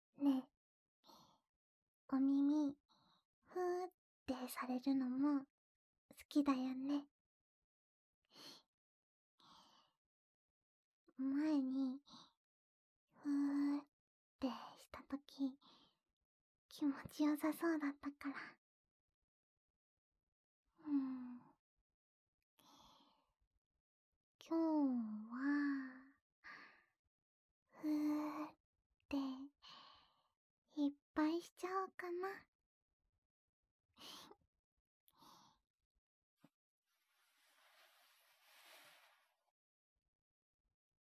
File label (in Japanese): お耳ふーっ